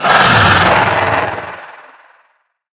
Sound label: Vocal Attack; Warframe; The New War; Archon; Nira